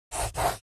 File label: Pencil underlining on paper